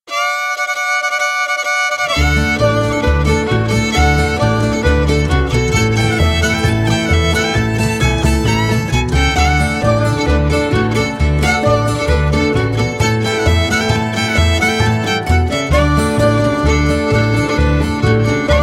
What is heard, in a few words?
Polka; Red Hills Polka